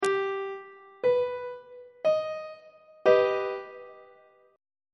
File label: d sharp augmented 1st inversion on treble clef